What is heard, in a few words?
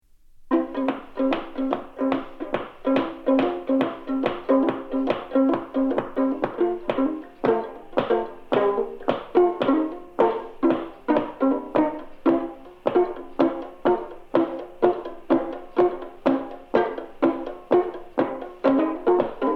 Acoustic Hillbilly Jive